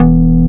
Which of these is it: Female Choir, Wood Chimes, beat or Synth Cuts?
Synth Cuts